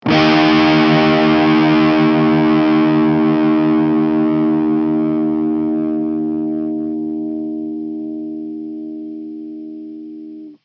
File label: Dist DSmaj; Hylics